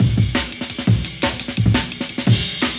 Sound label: Amen, m8 breaks